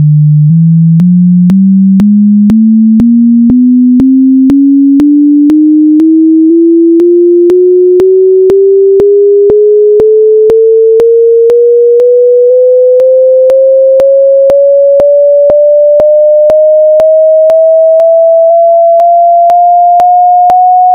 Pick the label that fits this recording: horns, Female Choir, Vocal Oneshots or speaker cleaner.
speaker cleaner